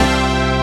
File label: dance chord